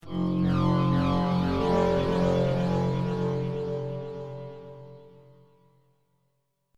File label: Dystopian Transition, sci fi music; science fiction sound effect